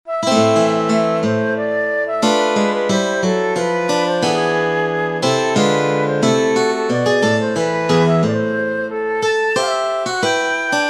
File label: courante; Rameau, Jean-Philippe